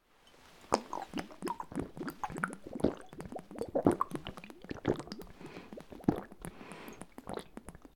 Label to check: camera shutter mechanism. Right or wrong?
Wrong